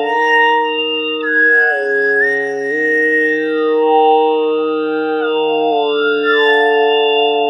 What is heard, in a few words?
synth